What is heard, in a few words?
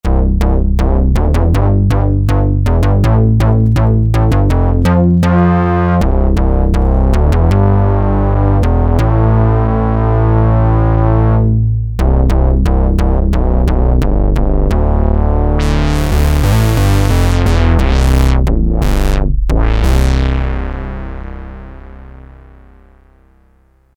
Bass, Novation XioSynth 25